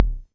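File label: mattel tom, Mattel Synsonics drum kit, lo fi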